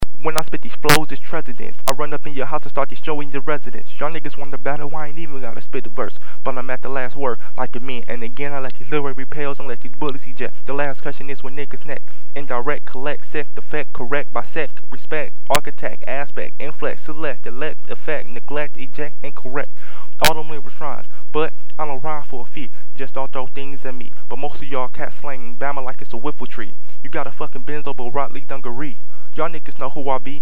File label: mic check freestyle